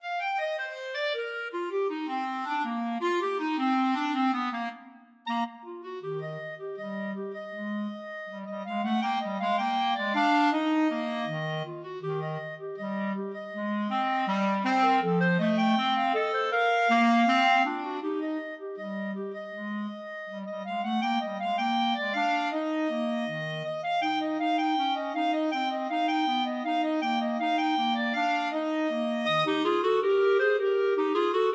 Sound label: clarinet duet